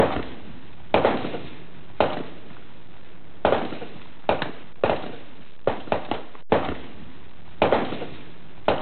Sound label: gunshots m16 dozen bursts distant